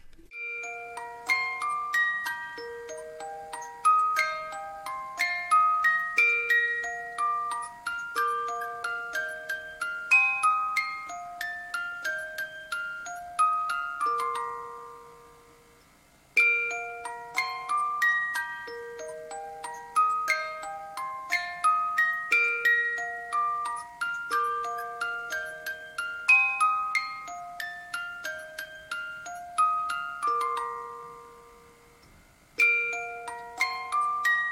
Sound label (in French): boite musique; antarctique; enfants; memoiresdoceans